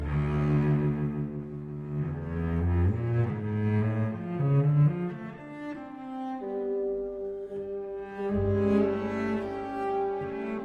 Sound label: CELLO; Concerto en la mineur de Schumann; Reine Elisabeth